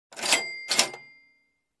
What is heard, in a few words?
Purchase sound cash register slower